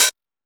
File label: Techno D; EMU XL-7